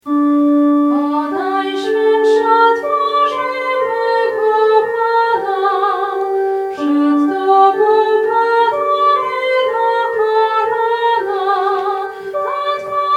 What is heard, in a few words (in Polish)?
soprany